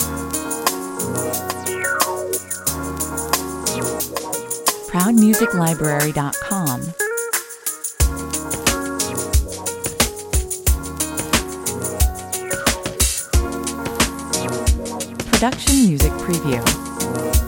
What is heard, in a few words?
beach cafe lounge 90 bpm